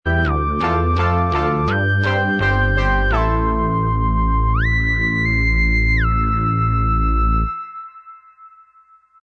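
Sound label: theme instrumental track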